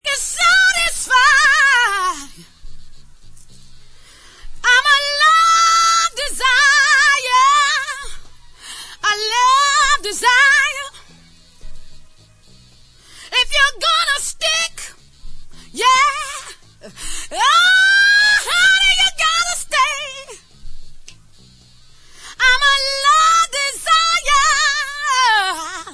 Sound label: original acappella version